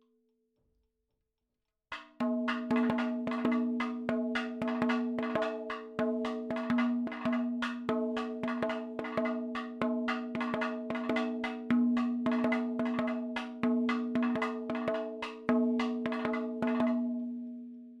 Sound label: 110 7 8 MADALAM, percussion